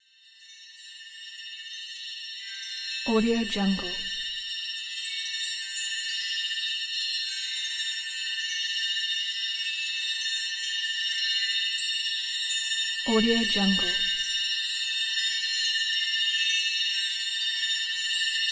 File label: evil magic transformation